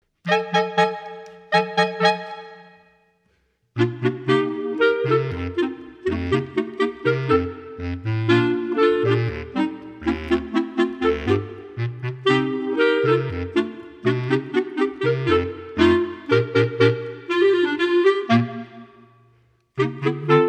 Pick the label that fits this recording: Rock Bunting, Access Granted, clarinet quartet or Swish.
clarinet quartet